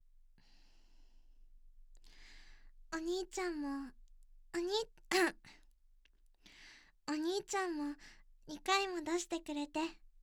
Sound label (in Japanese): 双子耳舐め&手コキ; 双子ボイドラ編集前